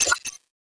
Menu Escape; Custom UI Sounds; Call of Duty Modern Warfare 2 (Campaign Remaster)

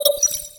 Menu Inspector Window Close; Custom UI Sounds; Call of Duty Modern Warfare 2 (Campaign Remaster)

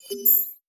Menu Escape; Custom UI Sounds; Call of Duty Advanced Warfare

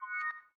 Error Message; Custom UI Sounds; Playstation 4

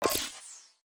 Menu Weapon Disassemble; Custom UI Sounds; Call of Duty Modern Warfare (2019)

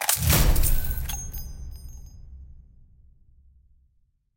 Quest Finished; Custom UI Sounds; Insurgency Sandstorm

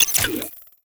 Button Bottom Bar Click; Custom UI Sounds; Call of Duty Modern Warfare 2 (Campaign Remaster)

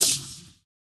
Backpack Close; Custom UI Sounds; Bloodborne

